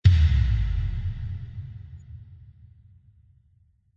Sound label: Epic Movie Trailer Bass Drum Sub